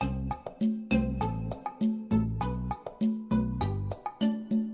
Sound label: music